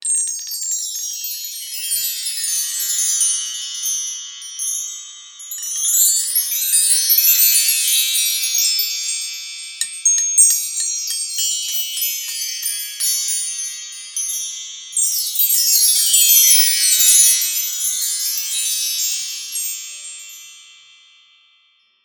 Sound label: Meinl HCH1R Chimes